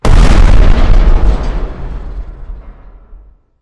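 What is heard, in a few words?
explosion mid distant kaboom; corporate; Titanfall 2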